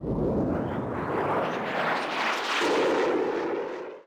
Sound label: jet Short; HeavenStudioPlus; spaceSoccer